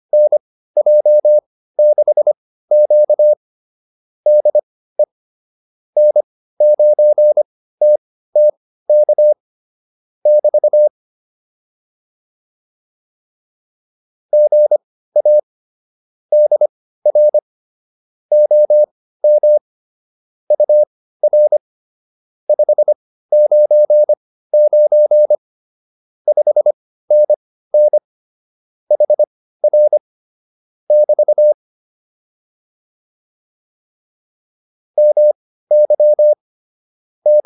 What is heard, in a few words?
QSO, intermediate practice files